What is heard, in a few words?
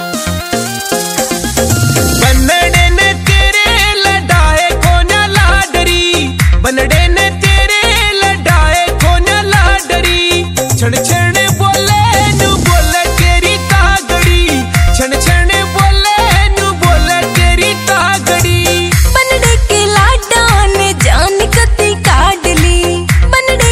haryanvi